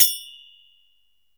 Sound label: OPEN KASI, FINGER CYMS; Spectrasonics - Heart of Asia CD2